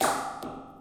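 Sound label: sounds of metal cable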